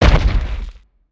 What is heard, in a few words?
Spider Boss Jump Impact; creatures mechanical